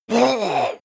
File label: leprechaun gasp hit; koerpergeraeusche; comedy-cartoon; soundpool